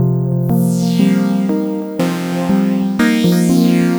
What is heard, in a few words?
Synth, Synth Textures